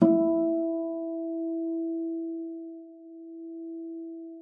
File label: FLAG D#4, harp; Xsample VOL-8; HARP+FX